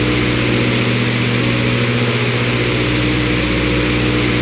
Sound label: Packard Merlin Prop Cockpit Prop In; DCS